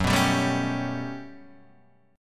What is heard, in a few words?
G Major Sharp 11th Gb, chord